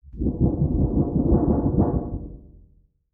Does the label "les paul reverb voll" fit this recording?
No